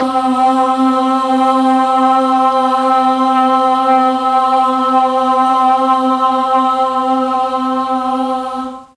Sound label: C2 boys choir, mellotron